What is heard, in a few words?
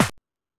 Techno F; EMU XL-7